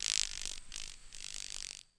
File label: GRASS, nature; PC Gamer IT CD 40; zones